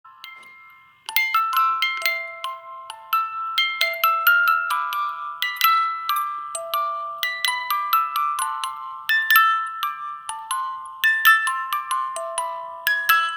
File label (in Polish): pozytywka